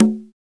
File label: conga lo, congas; 626; Drums In Your FACE!